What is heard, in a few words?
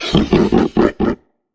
Troll Monster Laugh